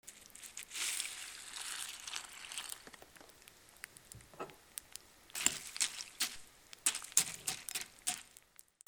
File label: KITCHEN